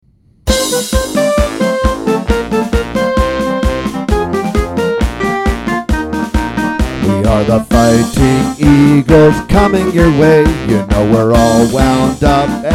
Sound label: spirit of eagles vocal